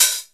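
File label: 909 OPEN H, TECHNO TRANCE; Pro-Rec - Synthsonic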